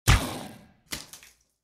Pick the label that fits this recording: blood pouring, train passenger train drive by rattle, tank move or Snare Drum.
blood pouring